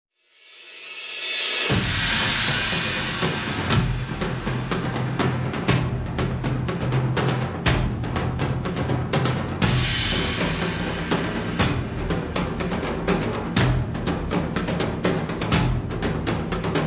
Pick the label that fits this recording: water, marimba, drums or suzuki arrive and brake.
drums